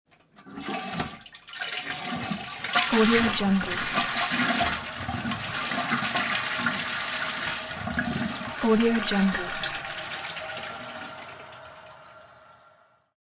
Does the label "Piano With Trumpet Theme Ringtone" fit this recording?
No